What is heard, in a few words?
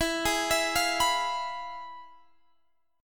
F Minor Major 7th Flat 5th, E